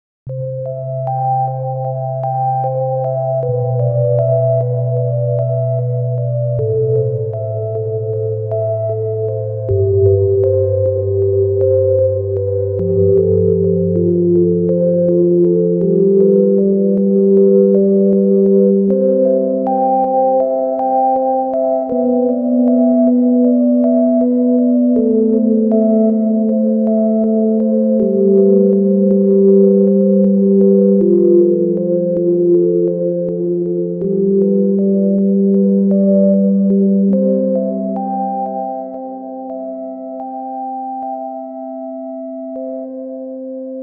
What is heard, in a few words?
Canon in C